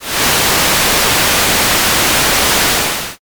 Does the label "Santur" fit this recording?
No